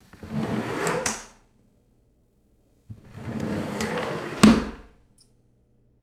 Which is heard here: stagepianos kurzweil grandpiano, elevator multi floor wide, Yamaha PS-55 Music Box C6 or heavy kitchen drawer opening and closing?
heavy kitchen drawer opening and closing